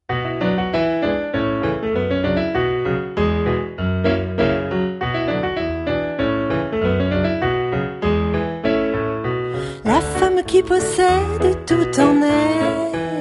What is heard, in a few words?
piano